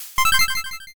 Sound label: line clear